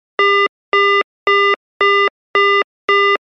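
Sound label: sound of telephone dial tone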